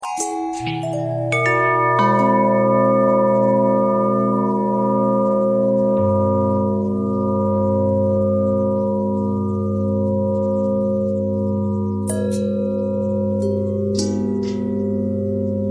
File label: bell long bell thread pentatonic